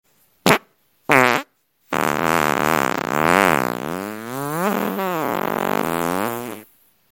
fart

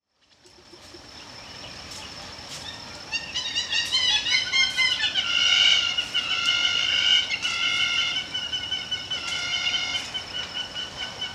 Hamerkop, پرنده سرچکشی

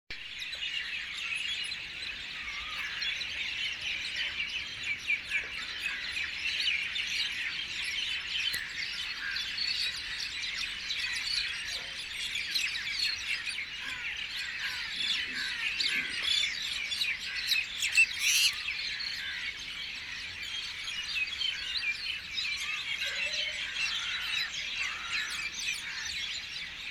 birds wildlife nature sound

